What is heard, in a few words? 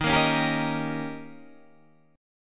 Eb 7th